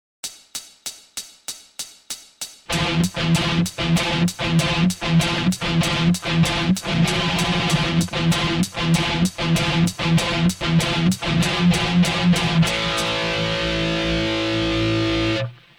thrash

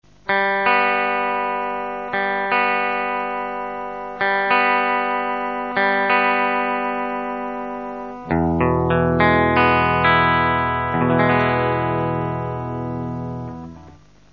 ear tune

